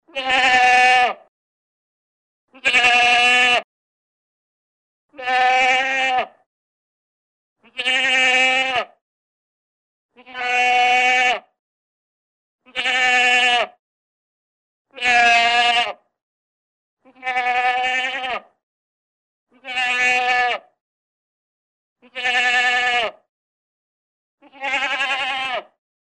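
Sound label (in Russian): koza golos, goat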